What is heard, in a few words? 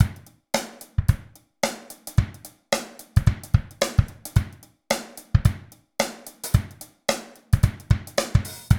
110, LOOPS; Best Service; Hyper Dance